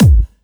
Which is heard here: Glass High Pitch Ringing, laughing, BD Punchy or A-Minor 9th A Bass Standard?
BD Punchy